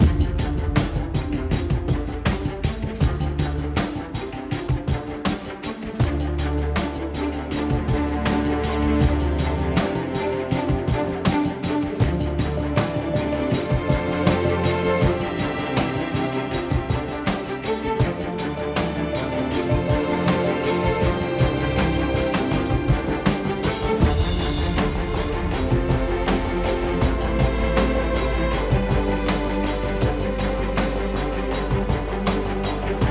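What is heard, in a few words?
Music Orchestral, Orchestral Loading 80bpm; Music Orch 3#5 (Pm Orch Loading 3 80bpm Game Mix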